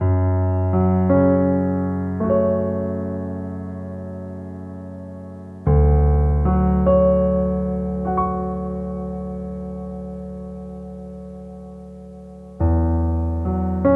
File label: Heavy Rain Walk; A Bird Story